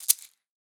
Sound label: shaker coconut